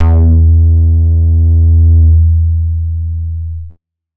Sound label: 90s club bass E3